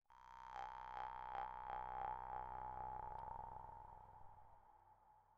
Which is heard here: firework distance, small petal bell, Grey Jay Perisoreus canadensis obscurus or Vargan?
Vargan